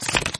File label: Card Flip Many